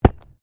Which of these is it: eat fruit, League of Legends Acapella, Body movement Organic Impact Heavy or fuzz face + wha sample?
Body movement Organic Impact Heavy